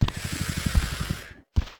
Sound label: peace walk, Mob; Elder